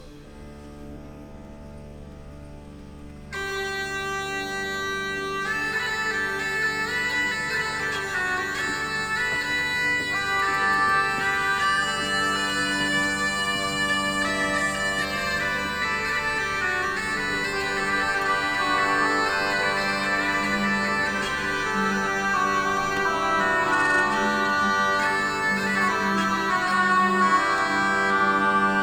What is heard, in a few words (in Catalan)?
Improvisació cornamusa & xeremia